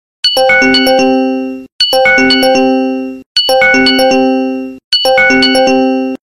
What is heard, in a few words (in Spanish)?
Ringer Business, tono de llamada